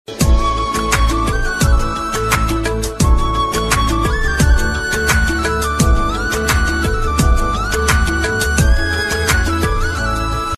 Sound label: instrumental ringtone romantic ringtone love ringtone punjabi ringtone